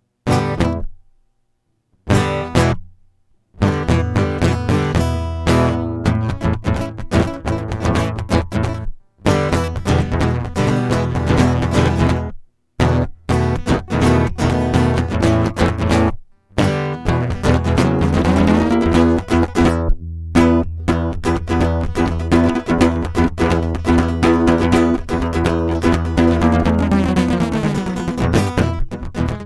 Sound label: acoustic